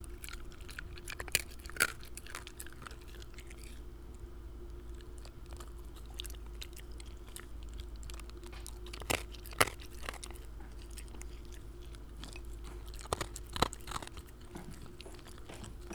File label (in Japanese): 柴犬の食事中